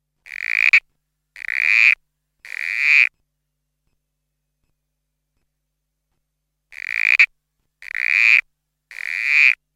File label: Pseudophryne corroborree (Corroborree Frog)